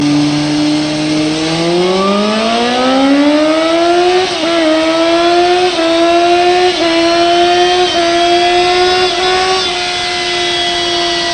Yamaha R1, Klingelton; Soundeffekte

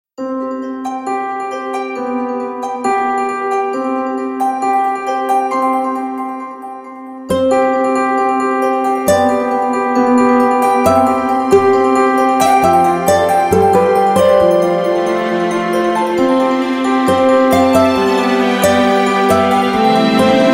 Samsung S10 – Realme Stock; Klingelton

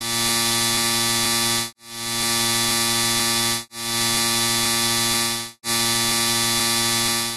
clock alarm extreme; Wecktöne

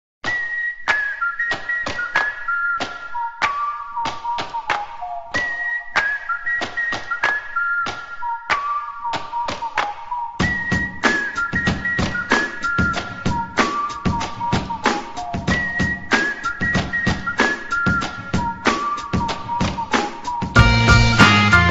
whistle samsung edge; Klingelton

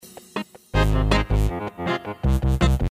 ragga SMS; Sms Töne

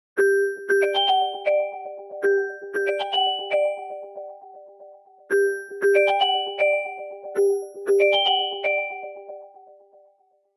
Samsung Galaxy S22 Ultra 5G Bell

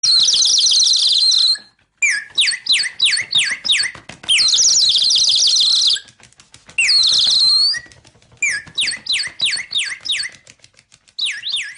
iphone 6s birds; Klingelton

